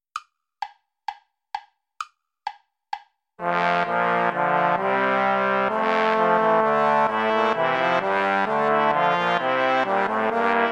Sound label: ballet play along without trumpet; praetorius michael; ballet des feus